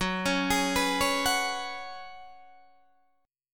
F sharp Suspended 2nd Suspended 4th, Guitar Open D